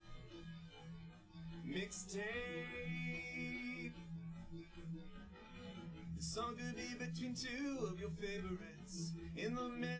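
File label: with drums live rec 1m sim; SASSEC07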